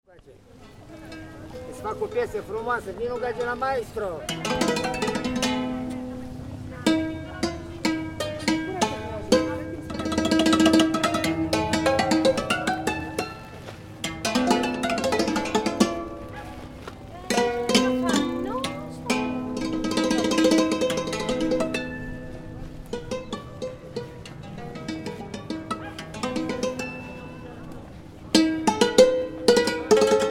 Street Music Santoor, Greek man playing Santori on streets of Athens; Greek man playing Santori on streets of Athens, Greece.; Concert Sound Effects; Lynne Music